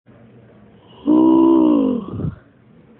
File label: yawn